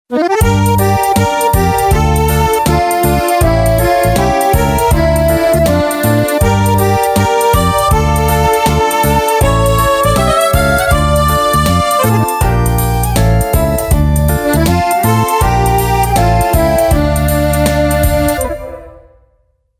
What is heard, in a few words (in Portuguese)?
XOTE